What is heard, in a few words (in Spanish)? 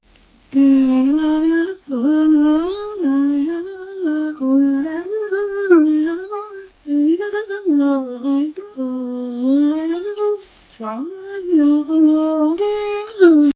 un bebe llorando